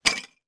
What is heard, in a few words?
foley broken dish pieces in bag down on table; zapsplat